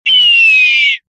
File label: hawk call; LegendsOfCoyoteGulch